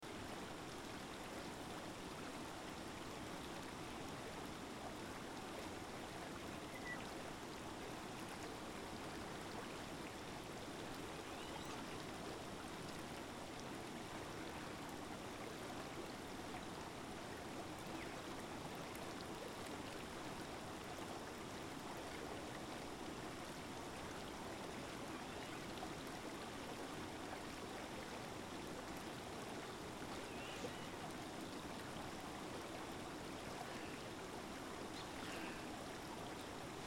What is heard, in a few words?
River